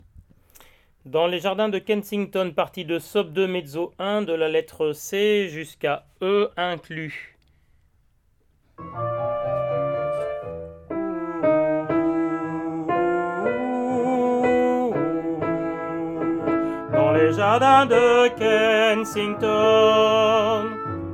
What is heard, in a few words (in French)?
Dans les Jardins Sop2 Mez1